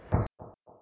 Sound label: dist gun; Soldat